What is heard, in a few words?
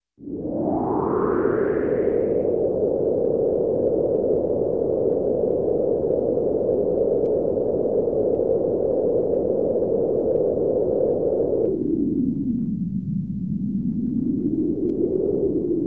Space Wind, Electronic; Sound Ideas 2000 Series